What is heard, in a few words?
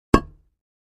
Patio Square Impact, concrete & brick